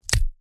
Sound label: Bone Crack; SoundsCrate